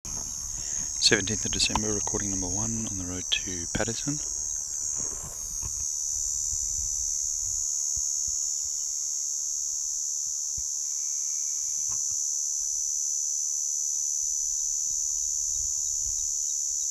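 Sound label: Platypleura hirtipennis; nr Patterson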